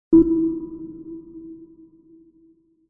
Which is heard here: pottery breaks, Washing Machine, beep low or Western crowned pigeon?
beep low